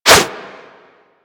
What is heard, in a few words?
Whoosh, Weapons; Warframe; Furax